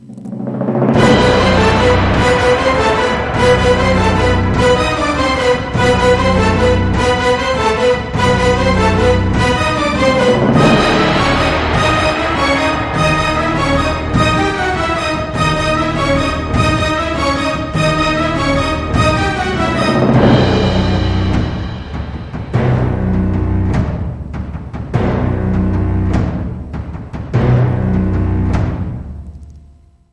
Drumwar

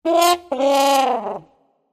Trumpet Wah Woww

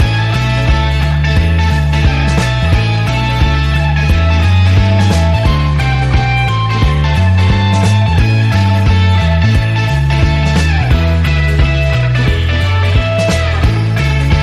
Swinging Jive 50s Rock and Roll

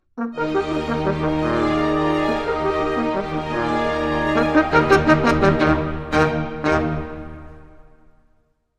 Horn Concerto Rondo Closing Sting

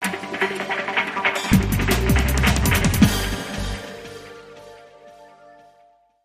Rise & Shine, Sting

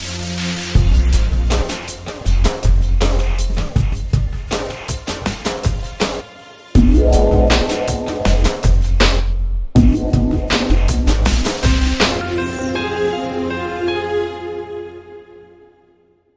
Sonic Big Beat Long

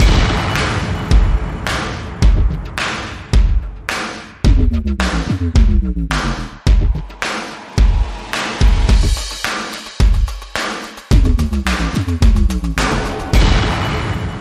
Drums and SFX

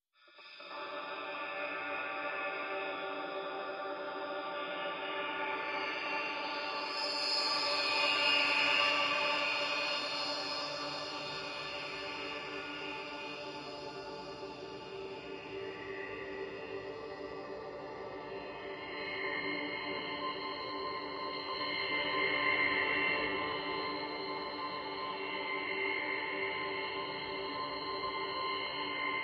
Drone

